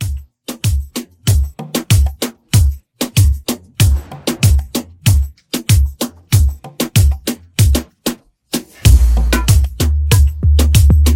Moombahton Redrum; Remixfreakz

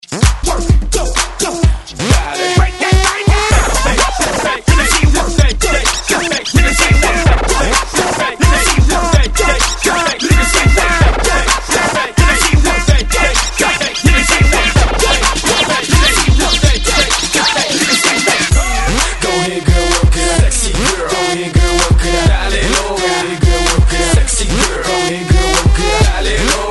Hype Scratch 128Bpm; DJDOLLS

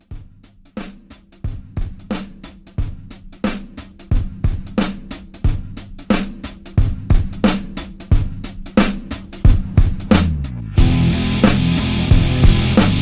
Halloween Re Drum 90 CLEAN

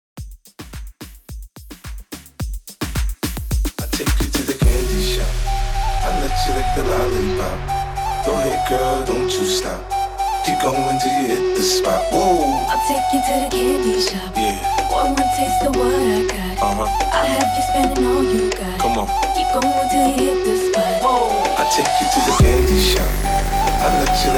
MOOMBAHTON 108 BPM

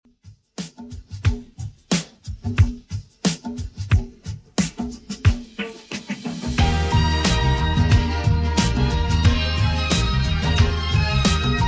60s Redrum, 90bpm

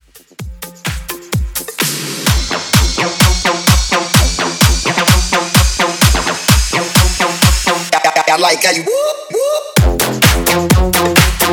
Club Dance ReDrum 128 BPM

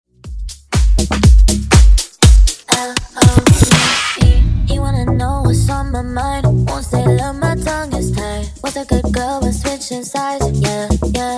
Funk Nu Disko 121Bpm

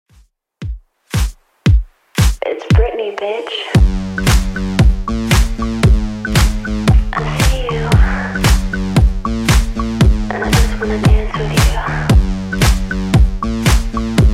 2ks Redrum 115bpm DIRTY

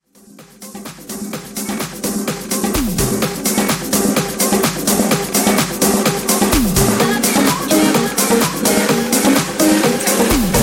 Afro House 127 Bpm

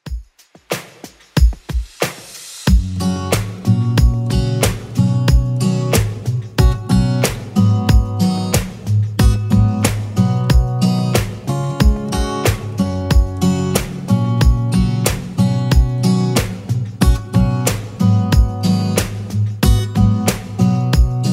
90s Redrum 92 bpm CLEAN

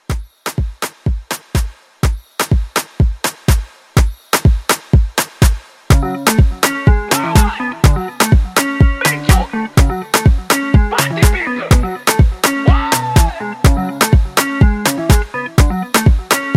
Afrobeat Pop Re Drum, 124

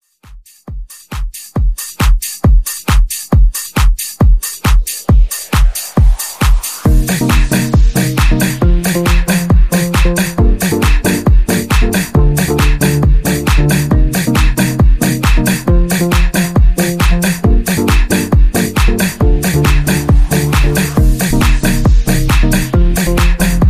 136 bpm

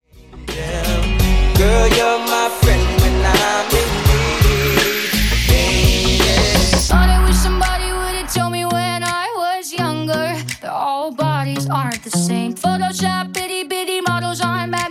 tiktok 84 bpm

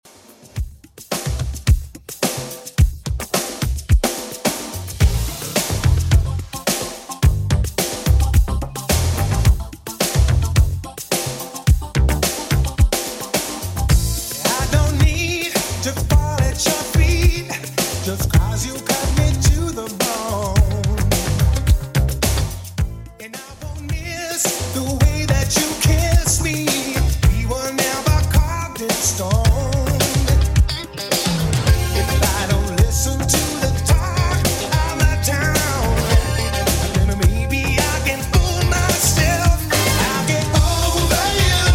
90s Redrum 106bpm

